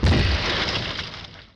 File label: crash; PHARAOH